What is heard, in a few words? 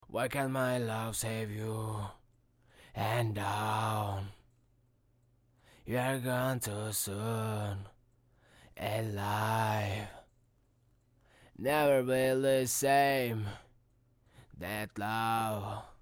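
hip hop 82 Am, acapella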